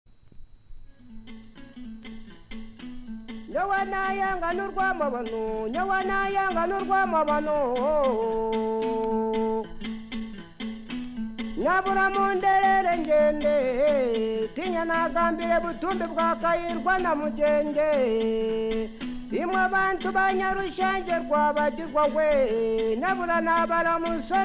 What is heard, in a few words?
Enanga Kiga, uganda